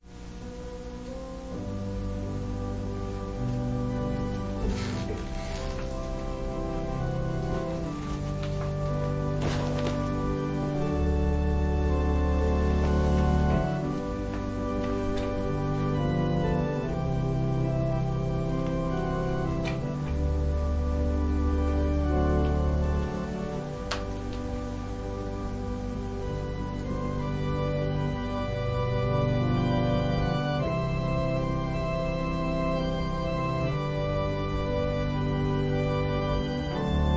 Organ prelude

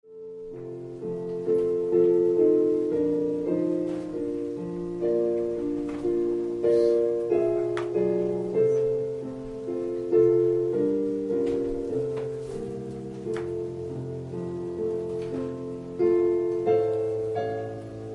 Piano prelude

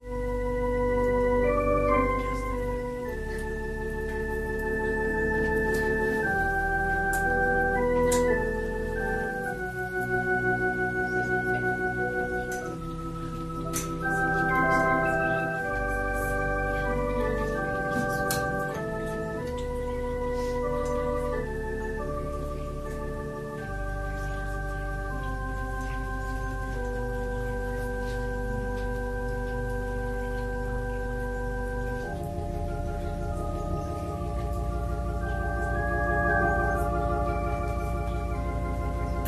Organ prelude

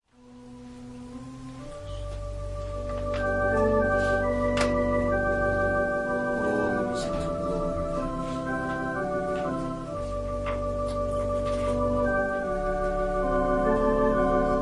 Organ prelude, Worship

